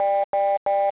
Warning Line

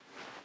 Sfx Baseball

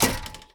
Physics-Object Saw Impact

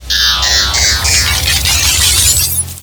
Giant Laser Charge

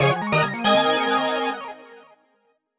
door open; Crash Puzzle Hammersan

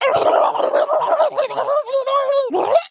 Mother Death

